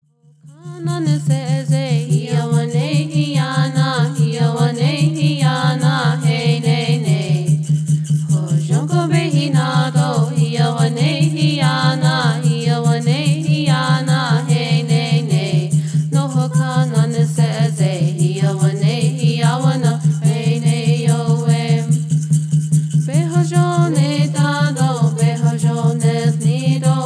Peyote Song

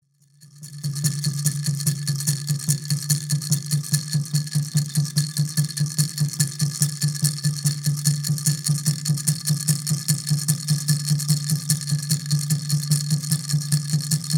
Native American Church Song

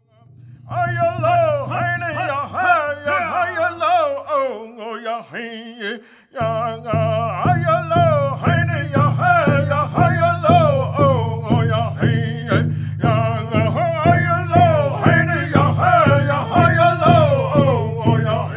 Early Morning Prayer

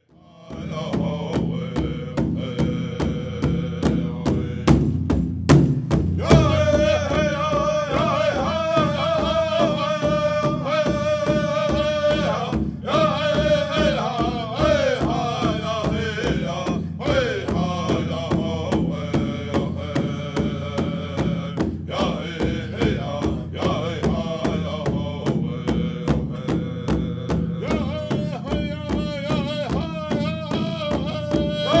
War Dance Song

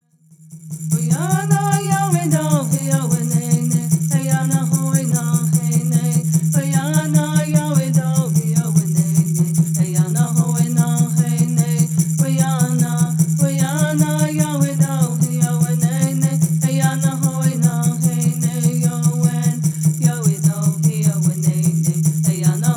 Peyote Song

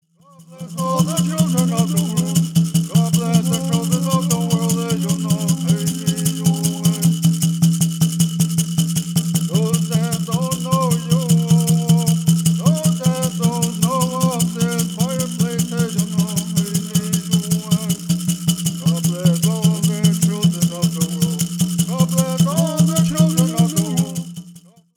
Native American Church Song

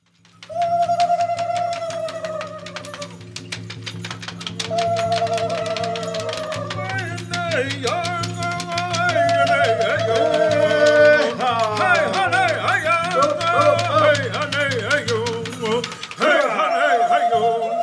Apache Crown Dance Song

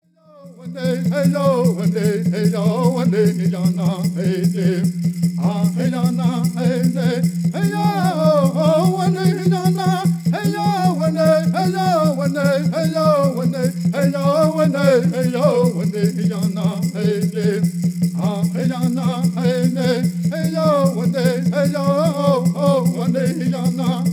Straight Song

